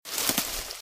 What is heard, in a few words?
Footsteps - Bush walk - Left; Eden; RadwinIsland